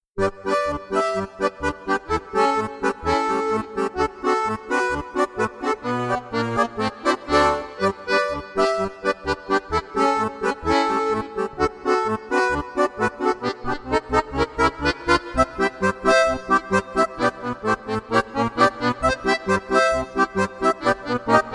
Railway Foxtrot Duet

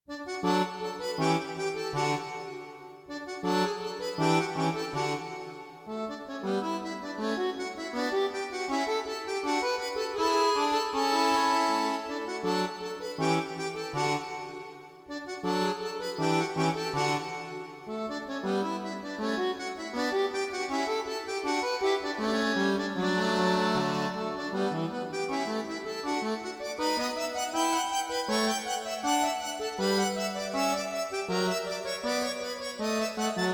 Bourrée

